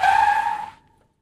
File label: car stop; cashnoire